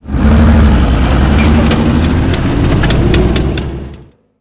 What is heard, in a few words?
panzer4 engine load, tanks; War Thunder